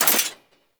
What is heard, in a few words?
Mech, Rifle; Thanotech; Trumna Prime